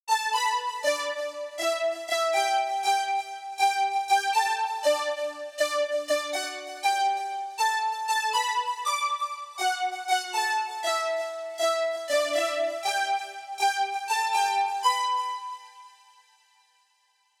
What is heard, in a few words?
junior lead